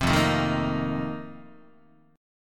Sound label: F 11th F, down, Guitar; F11 strummed; 3,1,0,1,2,2